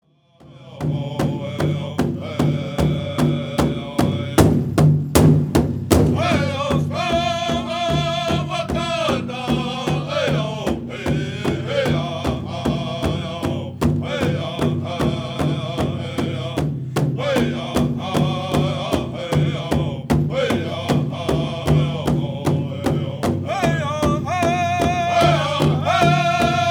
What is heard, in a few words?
War Dance Song